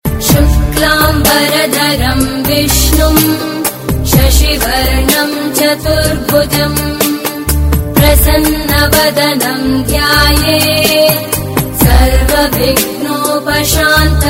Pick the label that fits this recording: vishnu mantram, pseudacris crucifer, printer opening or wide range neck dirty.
vishnu mantram